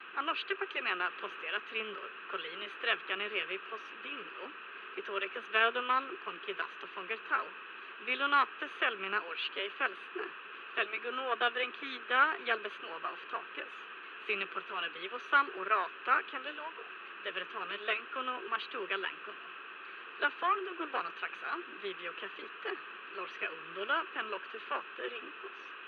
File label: TV studio